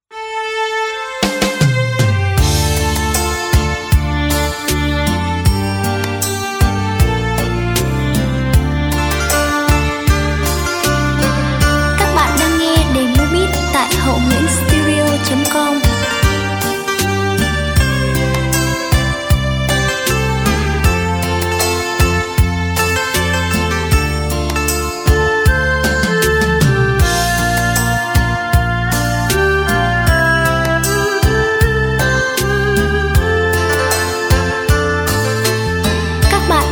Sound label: beat, playback